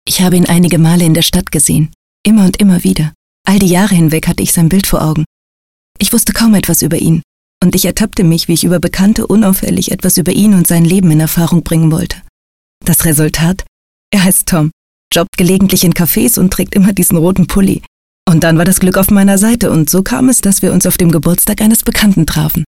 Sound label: jingle